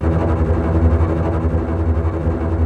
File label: TREMOLO E2, TREM STRINGS; Greytsounds VOL-1